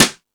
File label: Jazz Drums, E-MU Pro-Cussion